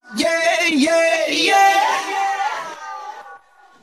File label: yeah yeah yeah sung; ooh ahh; voice